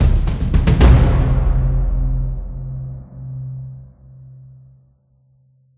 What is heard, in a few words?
JINGLE COMBAT START; prodigy tactics windows gamerip 2018